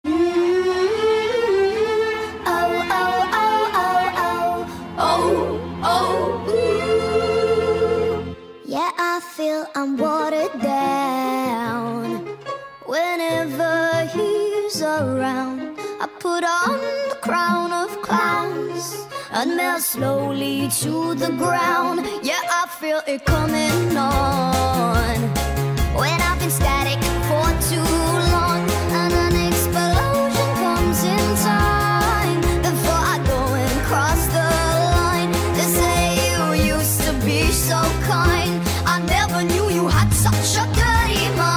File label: sped up